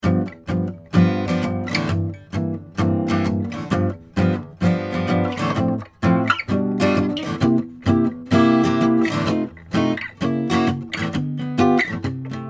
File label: Jazz, Neumann KM84 condenser microphone; MBox 2; Logic; no EQ or effects; SANTA CRUZ OM/PW AC. GUITAR; 24" iMac